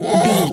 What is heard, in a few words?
hog hurt scream